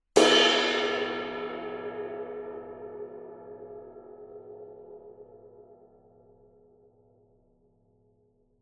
22 extra dry ride edge, paiste; masters

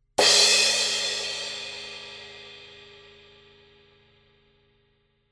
signature 16 mellow crash edge; paiste

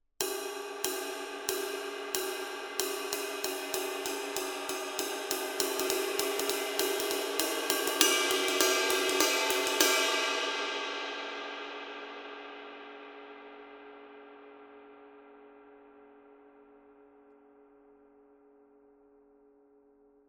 22 dark crash ride pattern; paiste; masters

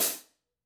14 dark hi-hat closed; paiste; masters

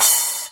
splash choke; paiste 2002

